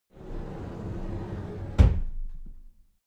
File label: cupboard slide door close soft